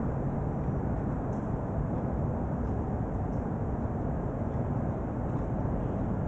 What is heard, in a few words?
amb zoom, zones